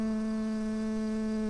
bumblebees, Hypnorum workers

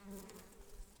Humilis workers, bumblebees

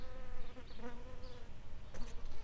Recording, bumblebees, Sylvarum workers

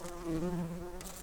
Lapidarius workers, bumblebees

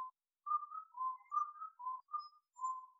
Indian cuckoo

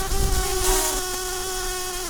bumblebees, Pascuorum queens

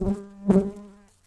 Humilis workers, bumblebees

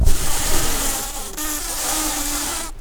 bumblebees, Pascuorum queens

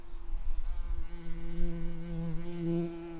Pascuorum workers, bumblebees

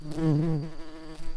bumblebees, Pascuorum queens

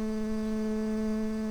bumblebees, Hypnorum workers

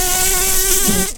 Lapidarius queens, bumblebees

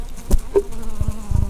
Lapidarius queens, bumblebees

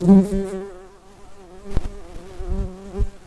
Pascuorum workers, bumblebees

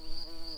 bumblebees, Lucorum queens

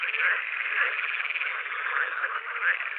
Pool-Frog, frogs

